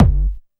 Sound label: bass drum deep, techno trance drums